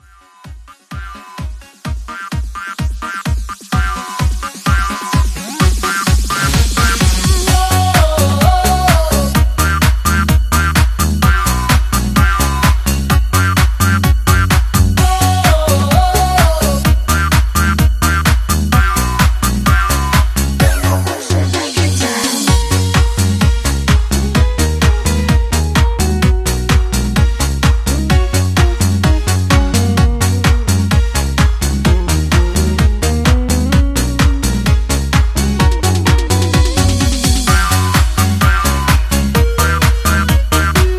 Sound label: Patter